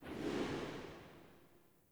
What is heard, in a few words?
Sparrow Death State Flight Whoosh; Animals